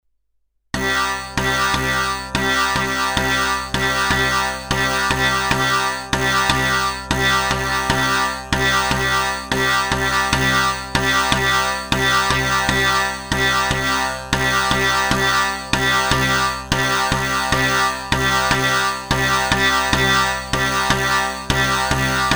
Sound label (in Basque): Ttun ttun erritmoa LA 100 BPM